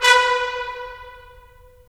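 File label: brass trumpets staccato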